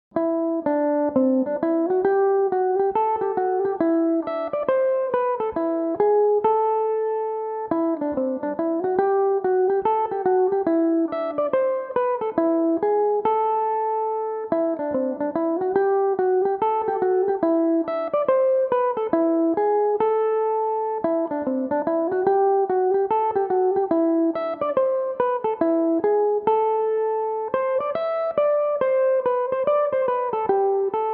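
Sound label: hornpipe A minor, Irish mandolin; Gan Ainm 18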